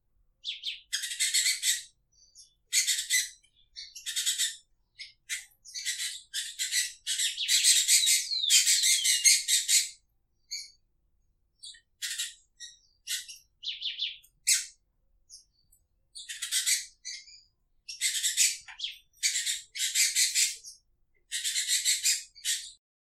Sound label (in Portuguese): Fêmea de tico tico macheando